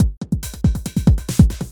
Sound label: Elektro Beat Pattern; echolab